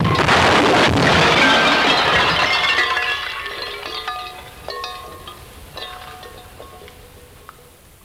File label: Car Crash Metallic